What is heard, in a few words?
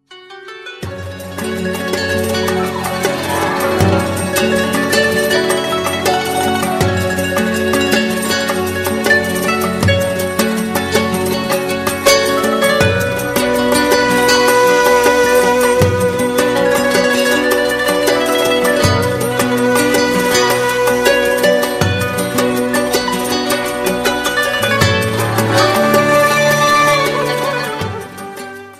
Slow Arabic Music